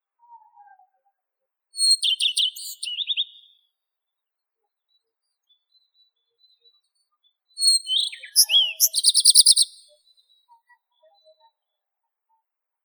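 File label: common redstart, Phoenicurus phoenicurus; gorihvostka obiknovennaya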